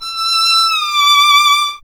STR E 6, Solo Instruments Vln; Miroslav Vitous

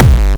Distortion Kicks, Bassdrums

